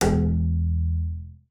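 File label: bass col legno E1; Xsample VOL-5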